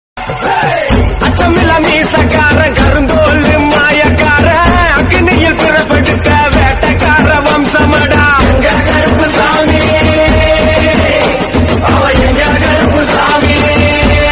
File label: Song Tamil South Indian; Karuppana Swamy